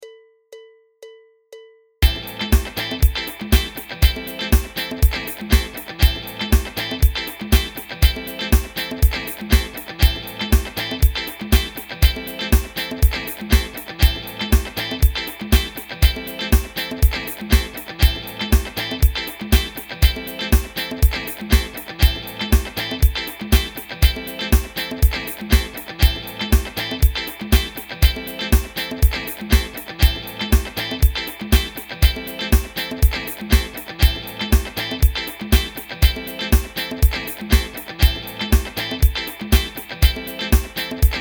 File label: impro am7